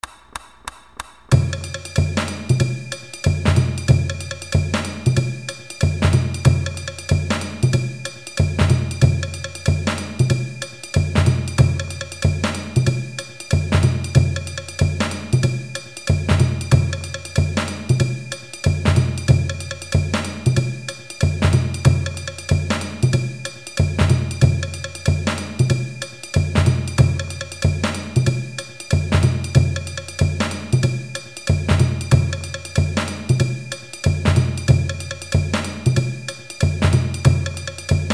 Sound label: tribute swing percussion